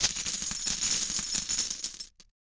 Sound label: Menu Currency Up; Golf Blitz 1.12